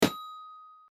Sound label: Airstrike Destroying; my cute commissar 2020 gamerip